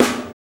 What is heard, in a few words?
BITE SNARE; BONUS SN2